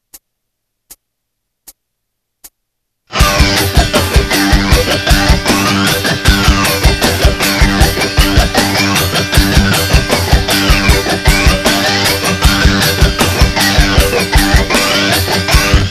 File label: scratch funky, guitar